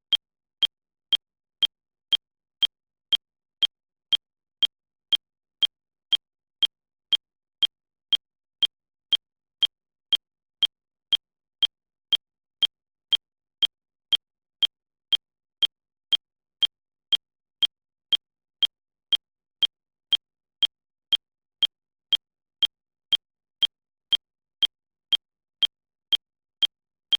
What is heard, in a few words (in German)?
ton burst